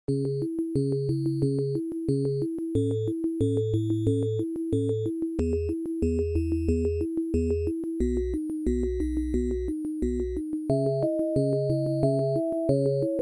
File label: 8 bit music